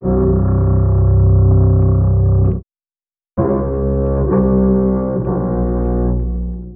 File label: bass, Loops, Sad